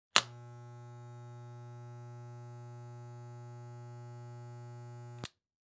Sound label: Trimmer Turn On And Off Electric Hair Trimmer Short, Household